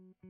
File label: Gtr, Ragtime